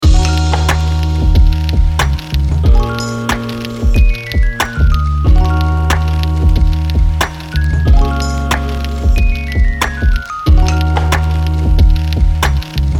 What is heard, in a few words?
lofi chill